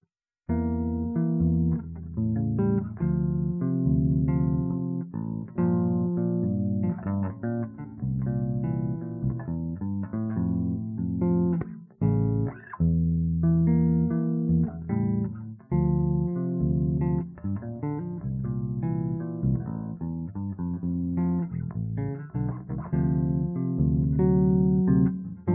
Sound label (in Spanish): Folclore; 2cajas; Yamaha BBG4 A2 Activo; Yamaha BBG4 A2 Activo. Boss Loop Station RC2 en algunas bases.; ultra high activado